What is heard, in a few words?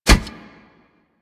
Step Metal, Footsteps; Warframe; Kubrow